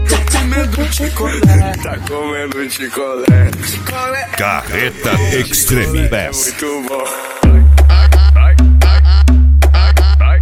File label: extreme bass; carreta